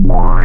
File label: Squelchy, wavetables, Digital; Massive 4-Digital-Hybrid